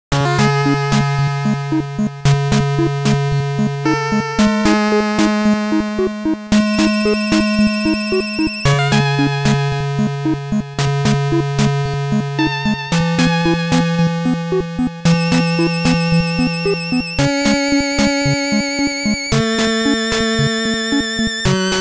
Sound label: Tune A; Loopz; NES